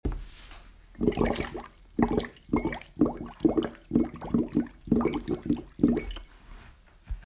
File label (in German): Geräusch